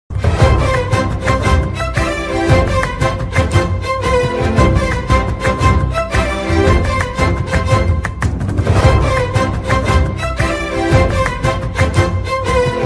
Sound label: ringtone; varshangalkku shesham